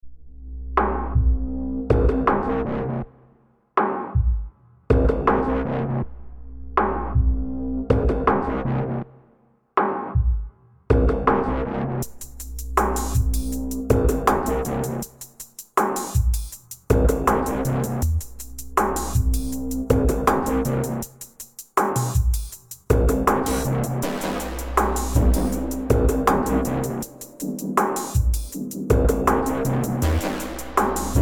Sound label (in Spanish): MUSICA PARA TUS ESCENAS MISTERIO